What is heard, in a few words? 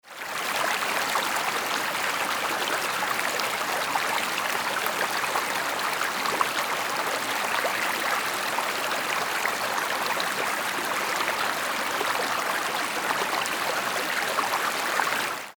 stream small